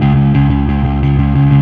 Fuzz C sharp 2

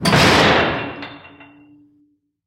Door Solitary Close; Prison Architect

